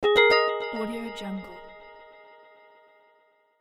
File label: happy notification